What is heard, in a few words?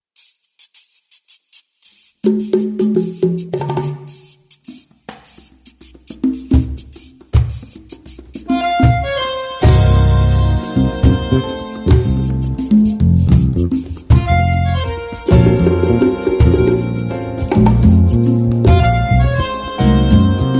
MILONGA HABANERA